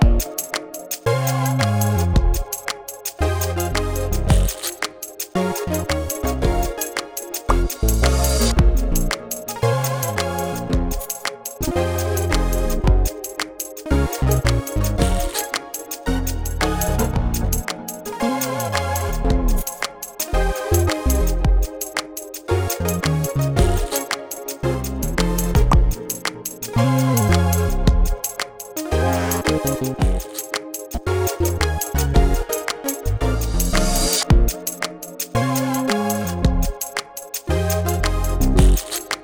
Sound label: 112 BPM Looped